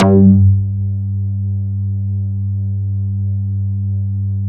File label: PH FFAT G4, Bass; Distored Reality 1&2